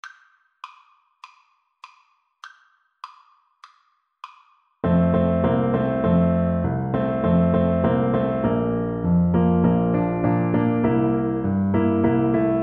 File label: jingle bells, Tuba